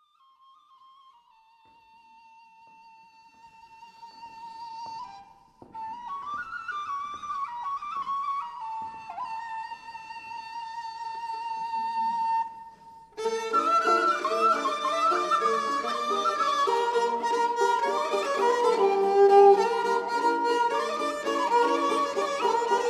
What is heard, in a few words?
A Collection of Bulgarian Folk Melodies; donne sofia